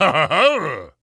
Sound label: king congrats